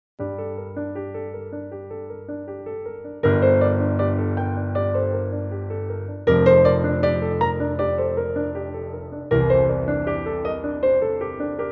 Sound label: theme piano; Une colombe et des hommes; Le divan